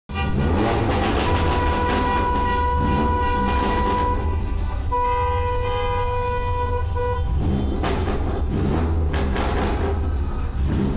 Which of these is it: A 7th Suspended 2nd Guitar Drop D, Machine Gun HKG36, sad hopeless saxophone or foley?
foley